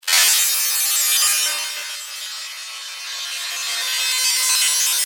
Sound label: Digital, Sweeper, Scifi; 5.1